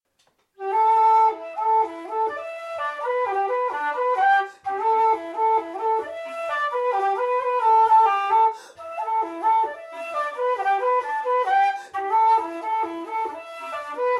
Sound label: DADGAD Guitar Accompaniment